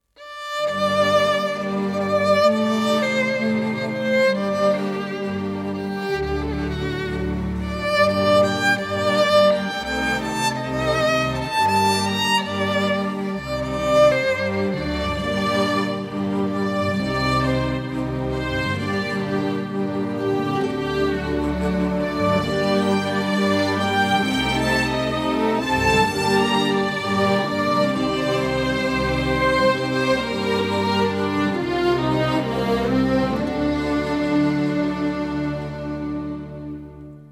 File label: instrumental, persian